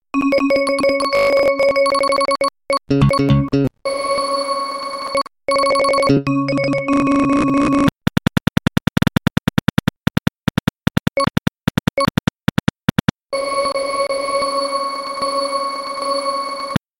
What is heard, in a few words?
c sharp sampled